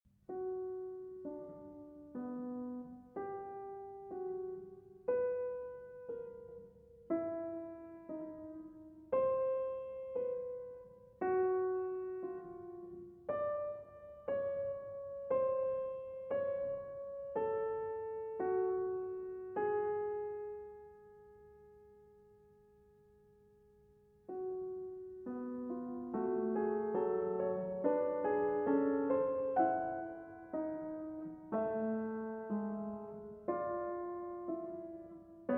Fugue in B Minor; Prelude & Fugue in B Minor, BWV 869

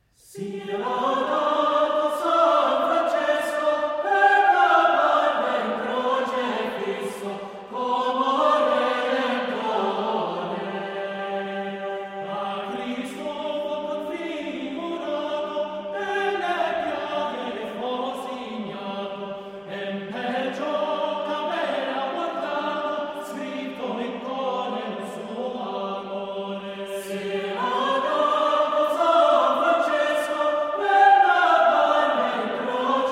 Vocal Ensemble; Laudario di Cortona; Excerpts Arr. P. Hillier

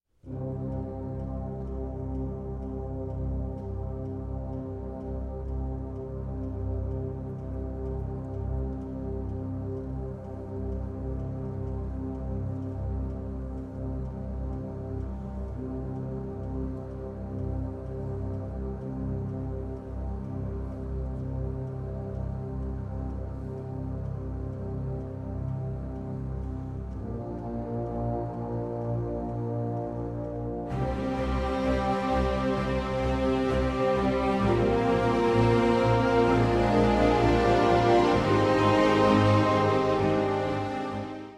Orchestral

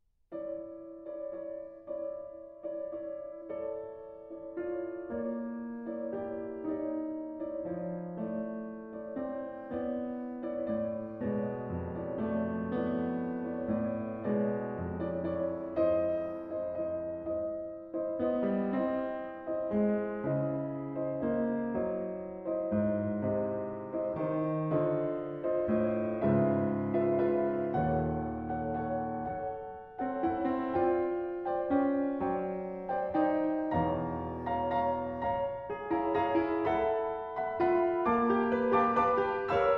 Piano Sonatina, Siciliano

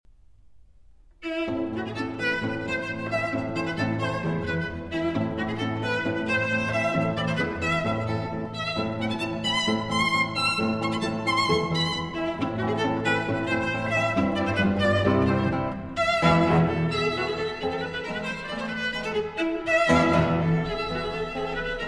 Quartet in A Minor, Rondo, Allegretto

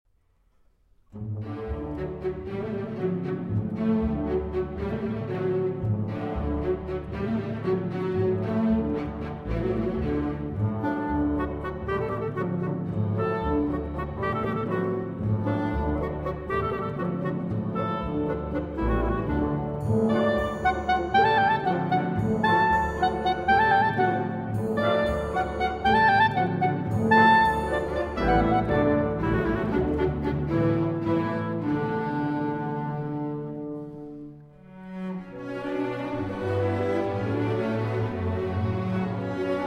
Suite For Clarinet & Orchestra

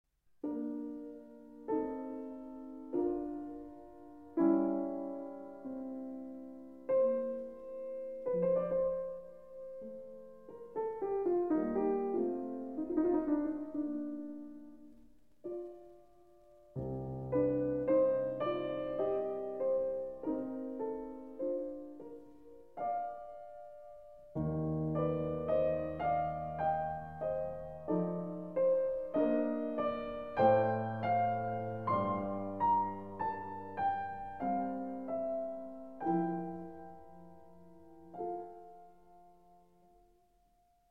Piano Sonata in F Major, Andante

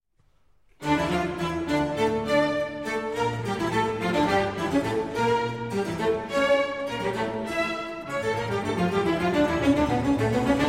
Violin Concerto in D Minor, Allegro

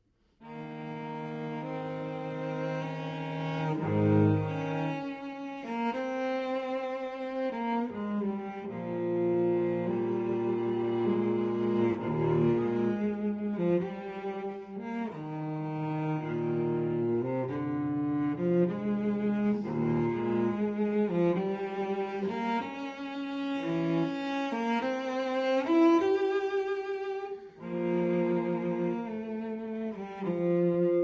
Cello Suite No. 4 in E-Flat Major Sarabande; BWV 1010

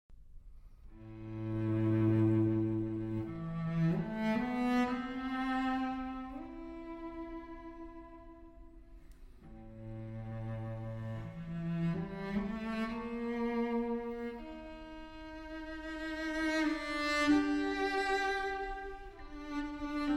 Moderato For Cello and Piano

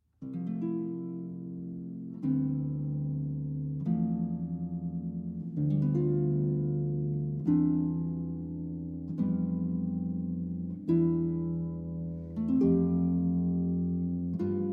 Suite For Harp, Hymn. Slow and solemn

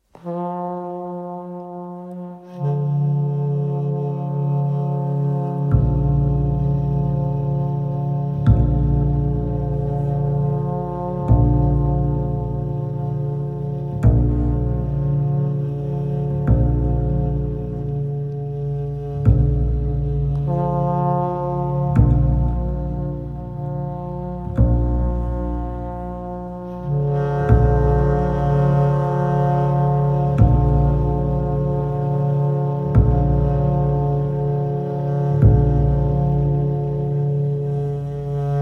B Flat Minor Prelude

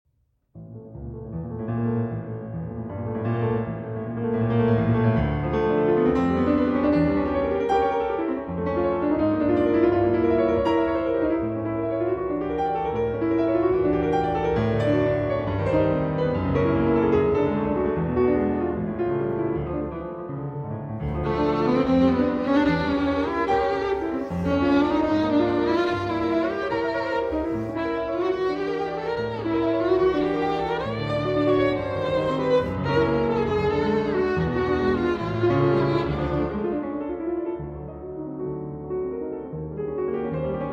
Sonata For Violin and Piano in A major; Franck; 2. Allegro

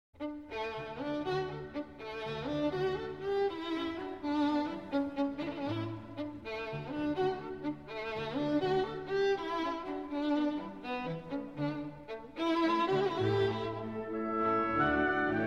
Violin Concerto in D Major; 3. Rondo

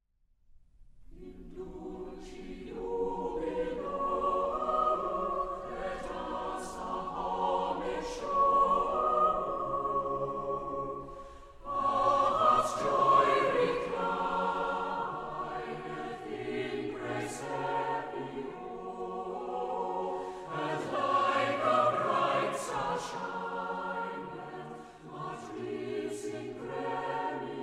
Choir

